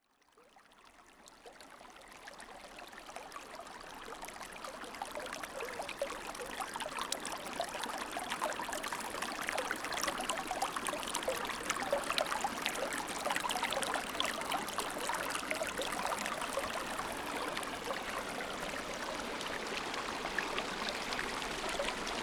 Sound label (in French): Ruisseau Descente